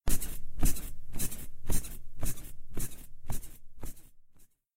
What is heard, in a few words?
jump on bed